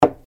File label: putting a cup